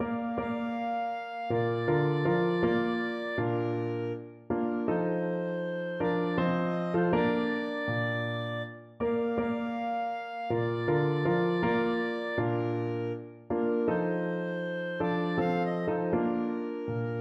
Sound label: am brunnen clarinet